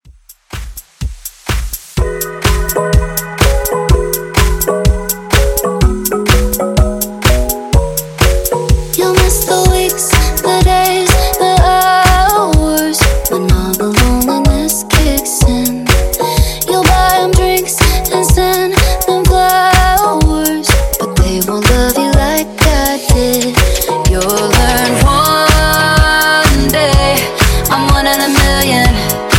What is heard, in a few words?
ReDrum Dance Top40 125 Bpm